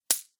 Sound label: Gold Coins Drop; Video Game